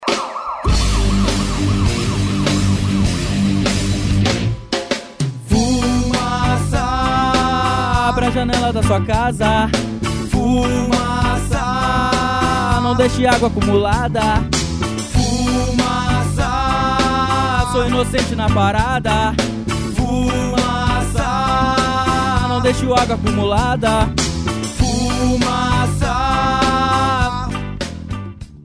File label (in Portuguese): jingle, canção